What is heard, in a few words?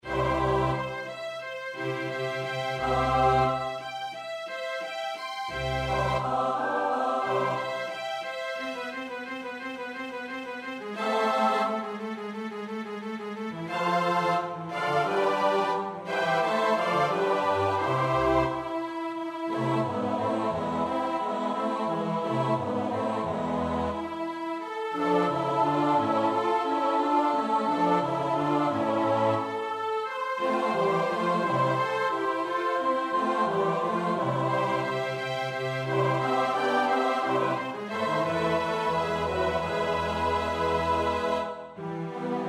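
soprano alto tenor bass; Bach (Johann Sebastiaan); BWV 071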